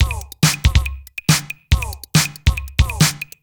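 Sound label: DRUMLOOP ELECTRO 140; Techno2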